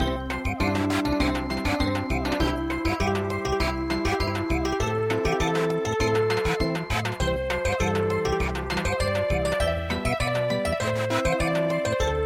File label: Samba